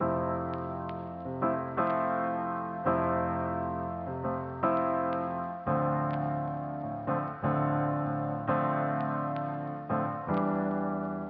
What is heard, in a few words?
Vinyl Piano F bpm 85